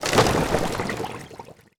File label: fishboat death; ships siege